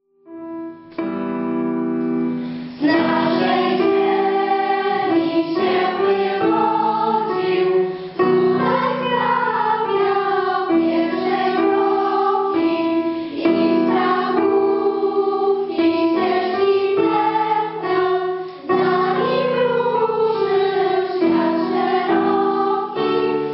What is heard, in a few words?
Hymn